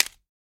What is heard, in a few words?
Bone Crab Shell Break